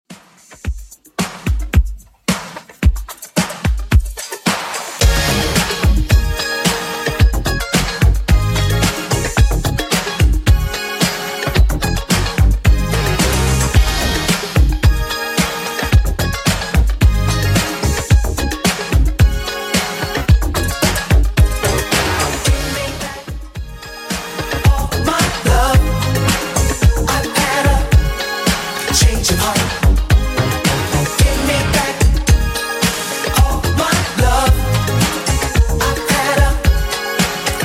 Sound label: 80s Redrum 110bpm